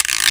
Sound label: guiro